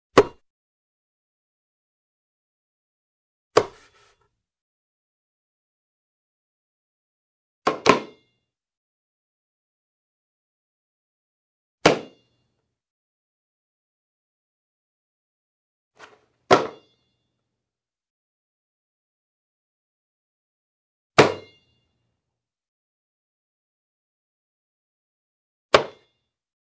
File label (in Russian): shlepok myacha o ladoni